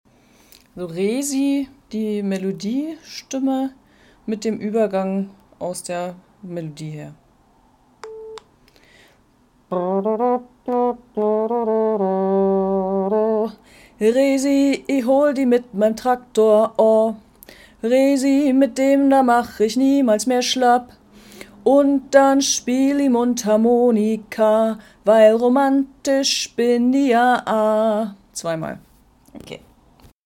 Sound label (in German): voc Melodiestimme; oktoberfest